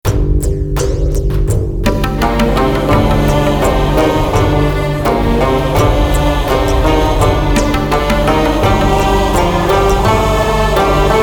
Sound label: Raza's Theme (Instrumental); Veer-Zaara